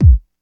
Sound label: rich lows kick single shot b key